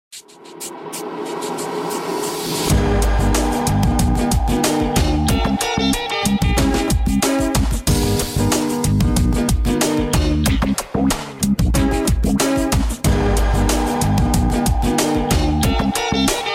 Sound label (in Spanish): vamos al mercado instr